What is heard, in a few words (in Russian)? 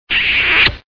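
поцелуй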